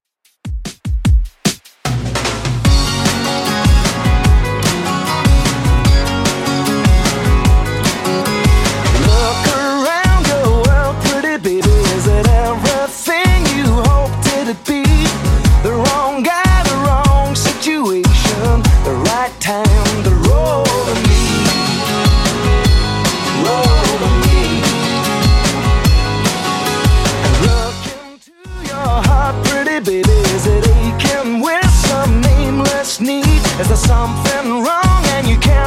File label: 90s Redrum 150bpm